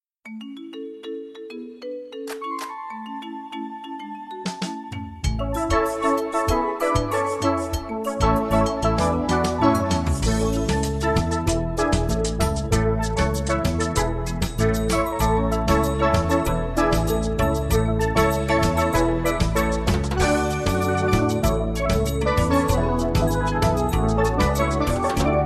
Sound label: ACCOMP